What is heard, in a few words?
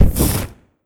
Fireball Impact Burn